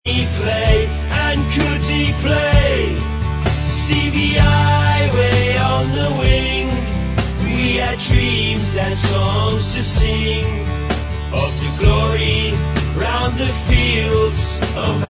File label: Bvox